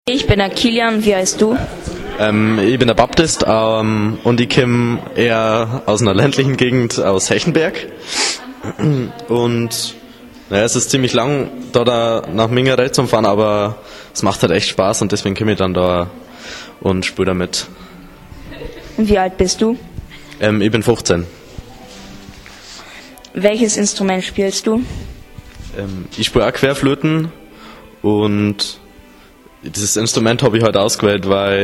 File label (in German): Querfloete; Querflöte; Blasinstrumente; culture kids